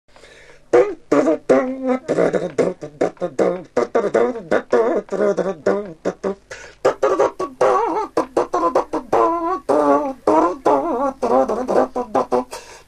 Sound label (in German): Godesberger Marsch